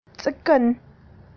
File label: Bird